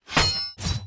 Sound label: Guarding Sound